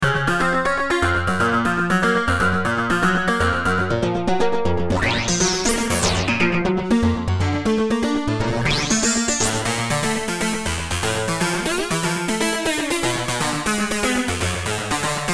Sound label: Synth; Doria